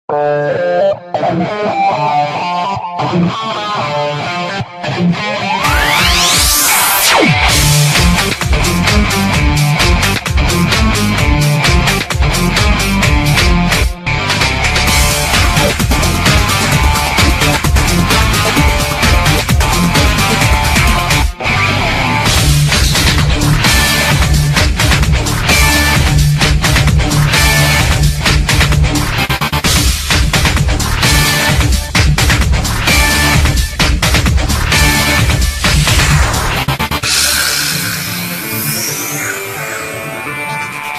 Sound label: Theme Song